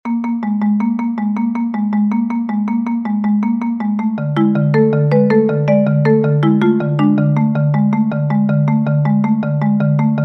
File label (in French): rythme bulgare; bartnik